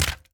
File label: wood hit